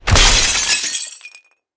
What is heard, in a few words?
win explode big win; wild worlds